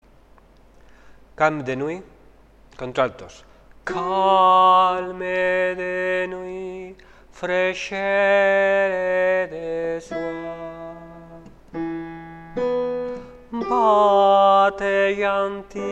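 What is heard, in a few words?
alto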